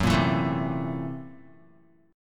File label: Gb 7th Sharp 9th Flat 5th Gb Guitar; strummed; Gb7#9b5; 2,1,2,2,0,x; JGuitar